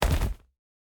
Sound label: Bodyfall Into Ground; CombatSFX